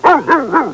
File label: Hound barking